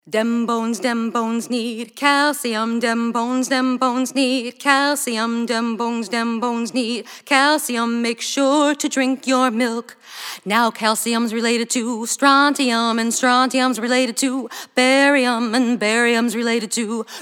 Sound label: Calcium Element Jingles